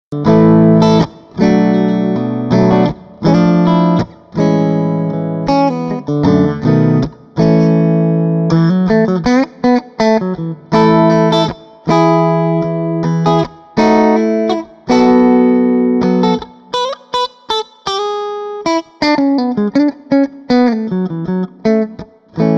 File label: 61 tele middle clean, pickups